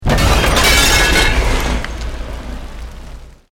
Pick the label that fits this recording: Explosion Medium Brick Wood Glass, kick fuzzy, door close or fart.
Explosion Medium Brick Wood Glass